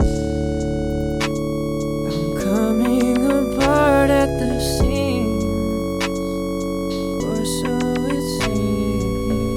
Full Beat RB

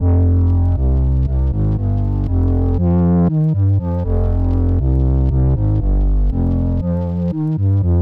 Pop Future Pop

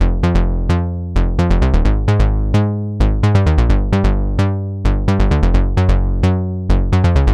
Synthwave Synth Pop

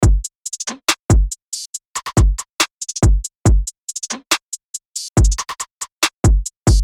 UK Drill UK Grime 140 BPM

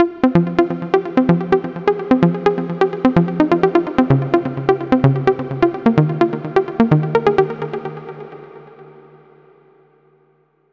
Exhilaration Fm 128bpm

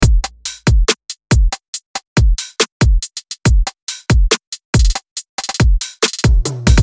Future Bass Melodic Trap 140BPM

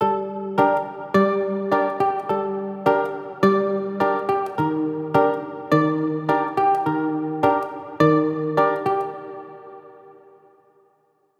Hybrid Advanced Reggaeton; midi wizard 2; Unison

